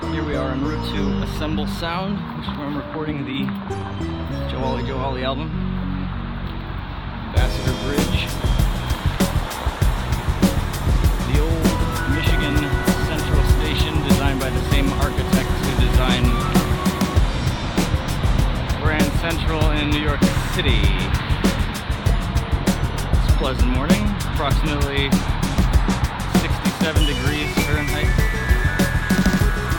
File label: instrumental (but with talking)